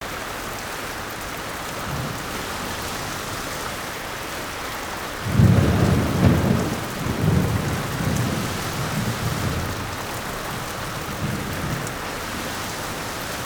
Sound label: amb; Coronado